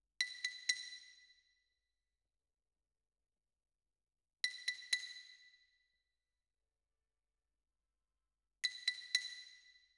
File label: Xylo